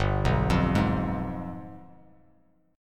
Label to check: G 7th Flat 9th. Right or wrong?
Right